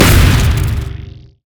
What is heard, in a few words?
wildfire; LoL; brand zombie